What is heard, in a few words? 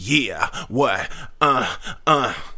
Rap Vox